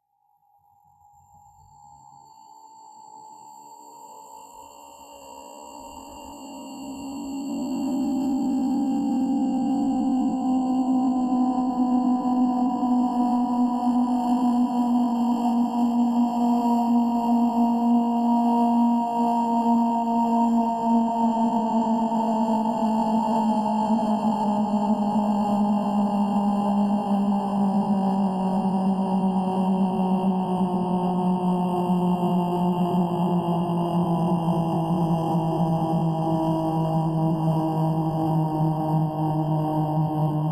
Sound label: a very long slow sigh; Lowlands: A Sigh Collective